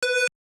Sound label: dial tone; OnePlus One China